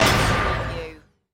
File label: Hit Metal